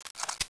awp deploy, weapons

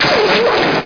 electro, weapons

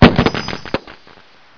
cake exp, weapons

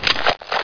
spas12 deploy

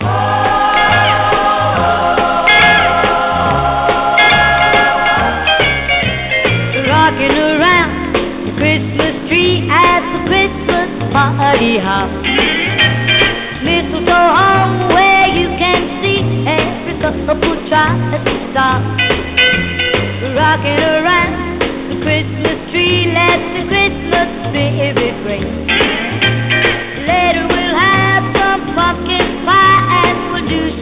Xmas Classic rock

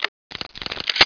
mauser reload

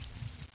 elite deploy, weapons

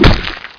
tomahawk stab hit

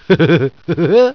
haha

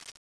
p90 clip release

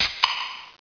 coke open

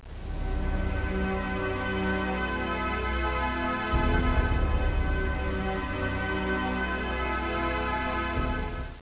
battle

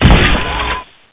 hook drain

